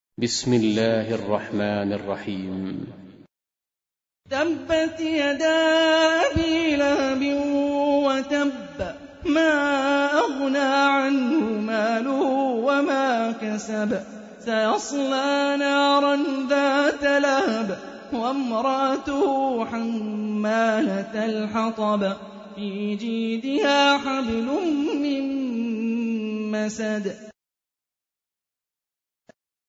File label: Quran; Hani Refaey